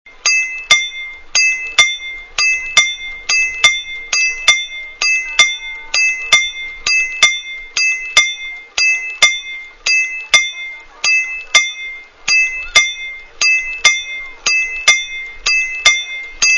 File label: bi tubes